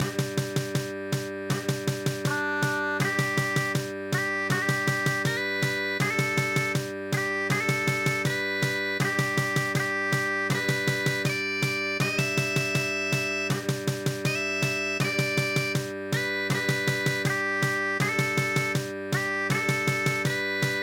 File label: Auld Lang Syne scotland